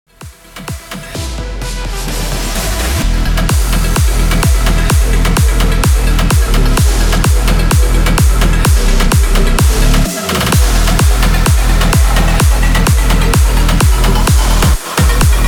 128 BPM

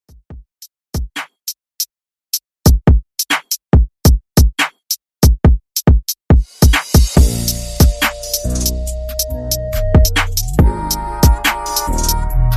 Drill 70 Bpm; Tribute; Redrum

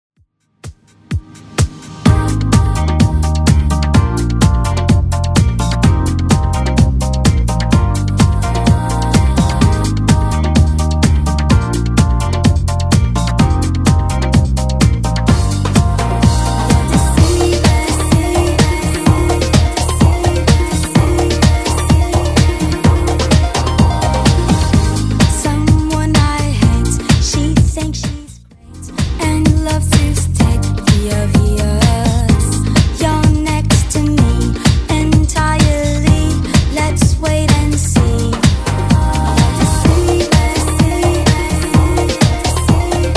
2Ks Redrum 127bpm

